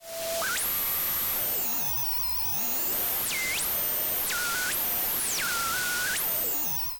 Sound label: Glitch, Electromagnetic Design; 344 Audio